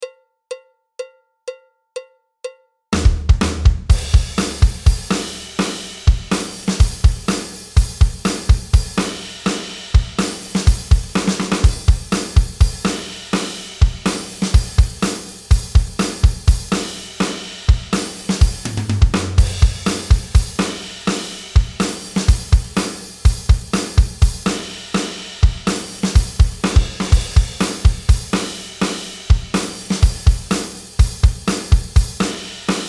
style, drums

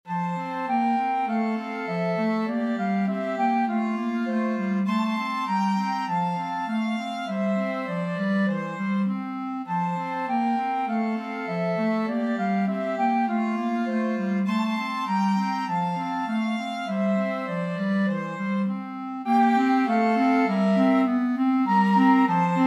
flute clarinet violin

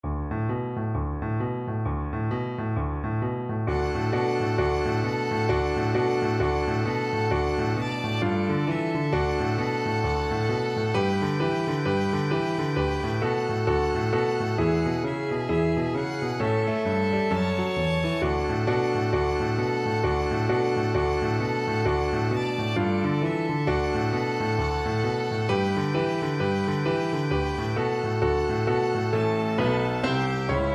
jingle bells boogie, violin duet

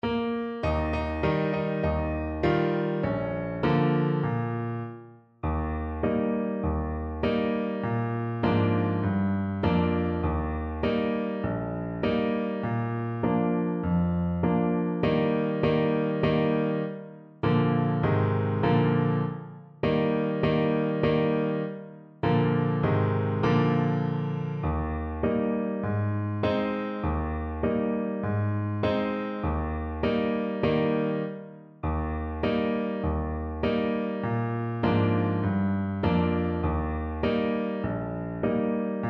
trumpet

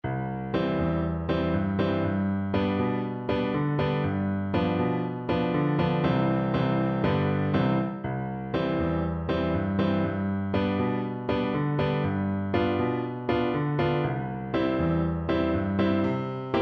virgin mary baby boy, Flute

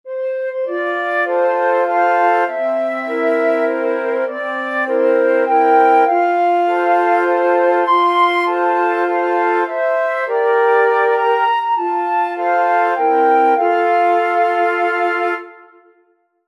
happy birthday, flute quartet

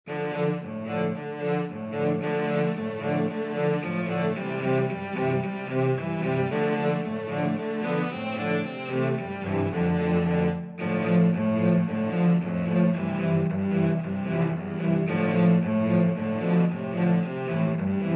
cello quartet

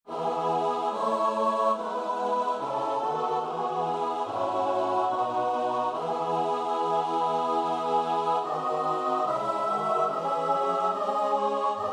st matthew passion, choir; bach

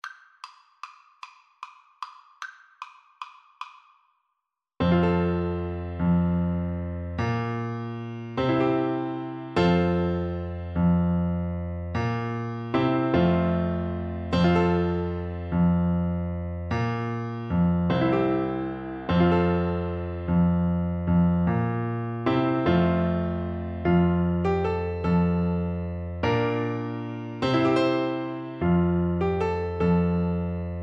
horn, French Horn

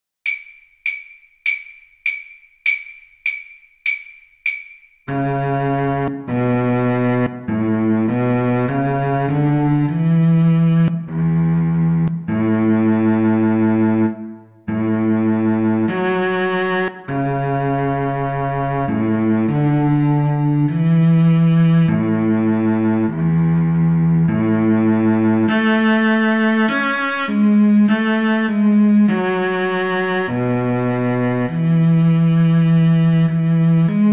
rule britannia, Violin Cello Duet

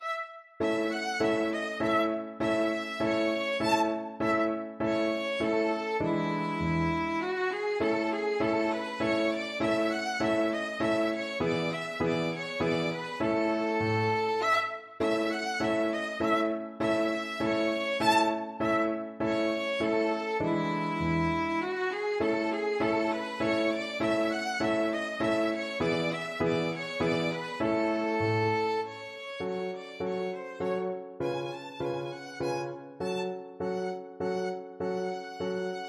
german dance violin

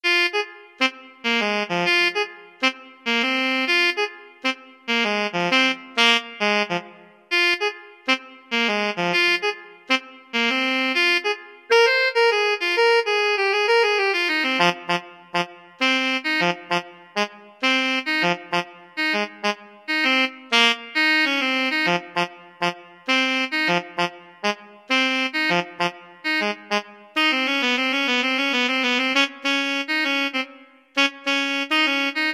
Funk Study, saxophone

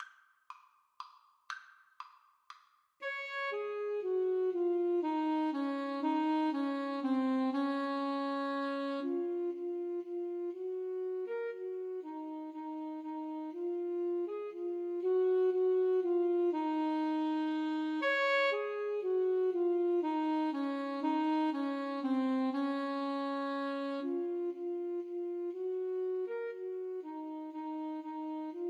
Menuett, Alto Saxophone Duet

